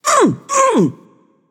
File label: notice; babayaga; smite